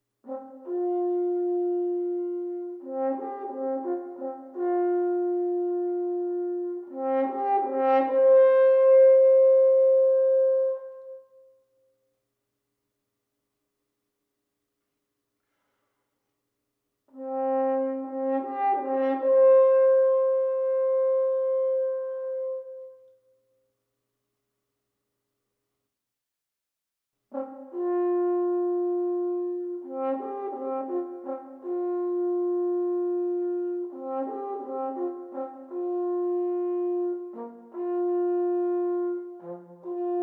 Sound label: Piano Concerto; Brahms